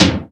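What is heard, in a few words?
SP-12, drum machine; Best Service Gigapack 2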